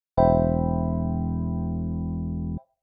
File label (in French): accord do majeur 7 basse mi; exercices